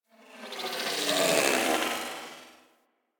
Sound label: Metallic Textures; Ambience; Warframe; Corpus Railjack